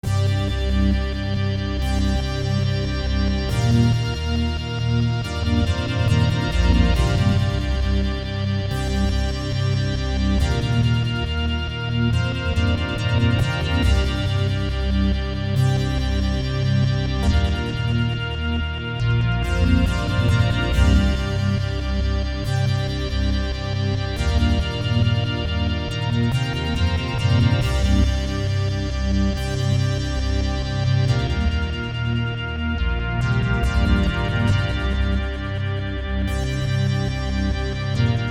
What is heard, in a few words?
Polyphonic Synth Passage with Subharmonics